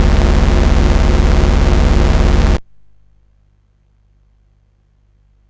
Dragonworld sid CSG8580R5

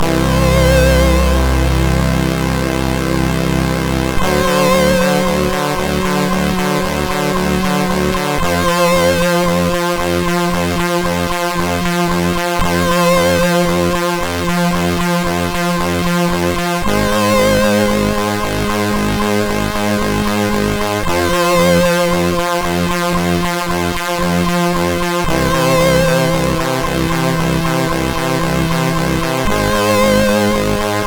E-Load loader, MOS6581R4; hvsc

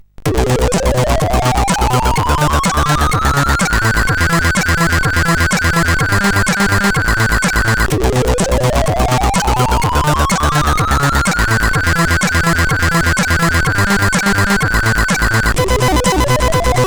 Gliding Beat, CSG8580R5

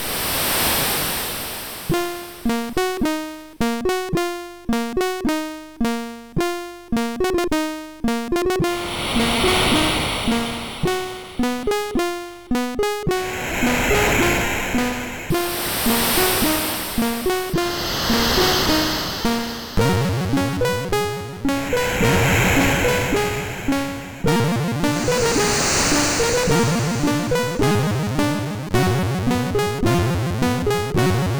Carillon, sid MOS6581R3

